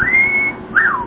wolf whis

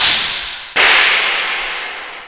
Open High Hat; instruments

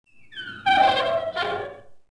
TC DOOR, door abandoned; PC Gamer IT CD 40; zones

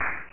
lava; Tetris Collection for Windows 98

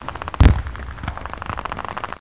battle

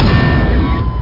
clang

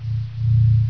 hum

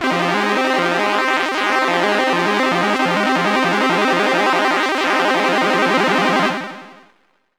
chords long, acordes

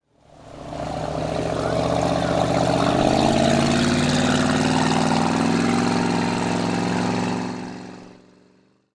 boat; EARTH

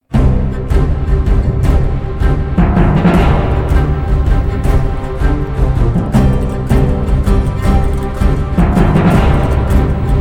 Combat Mediterranean, music; Settlers HOK

